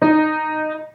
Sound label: low D sharp